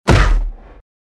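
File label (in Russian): zvuki padenii cheloveka, human falling sounds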